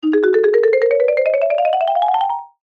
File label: cartoon ascend fast marimba